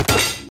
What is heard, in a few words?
table kathunk slam rattle